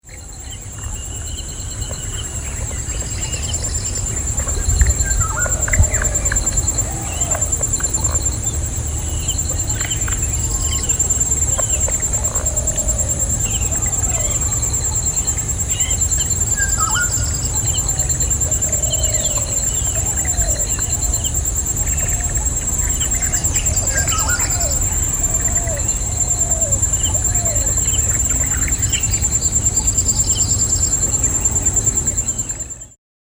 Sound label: Sri Lanka ambient